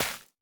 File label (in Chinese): 垂根破坏声